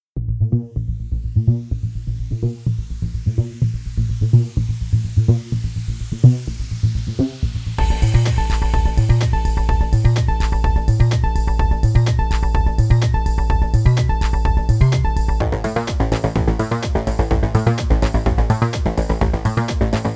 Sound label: vacuum pro